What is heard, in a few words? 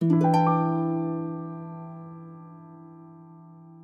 Harp Arpeggio Downturn Sad Reflective